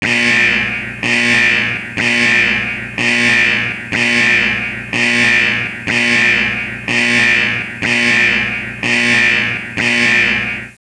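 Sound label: alarm long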